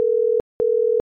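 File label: ringback tone Hong Kong; iOS Sounds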